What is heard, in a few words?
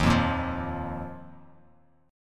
Eb Suspended 2nd Eb down; Ebsus2 strummed; JGuitar